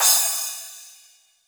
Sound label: crash cymbal sound sample g sharp key